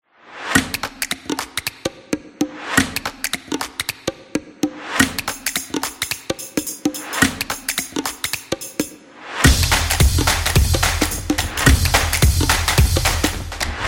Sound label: Upbeat Drumming; graffiti